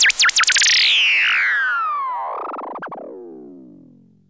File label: FALL, SYNTH FX; AMG - Global Trance Mission VOL-2